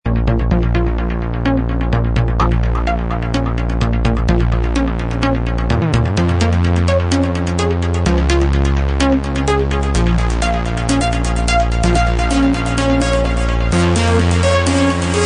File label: minimal and tech; promo mix